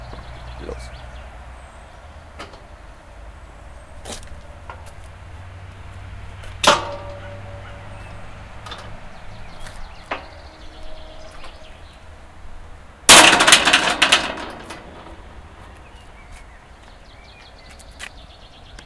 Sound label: cemetary gate open and close; Opening and closing the gate on a small village cemetary. A B or C road is quite close. It was recorded on a summer morning.